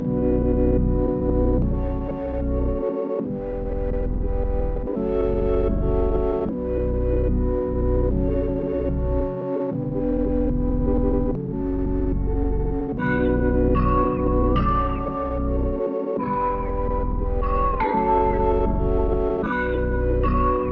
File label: 148 BPM B Min, Vintage & RnB Melodies; Veil; Cymatics